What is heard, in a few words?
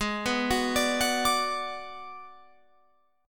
Ab Minor 7th Flat 5th Ab, down, Guitar, Open F; Abm7b5; arpeggiated